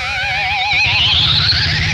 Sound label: DERVISH, Funk Guitar; 90 s Sample CDs; Zero G